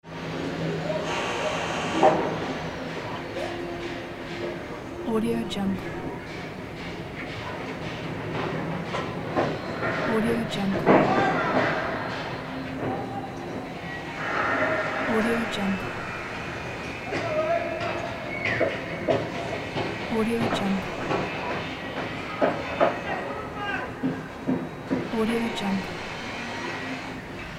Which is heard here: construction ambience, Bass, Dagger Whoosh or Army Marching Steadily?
construction ambience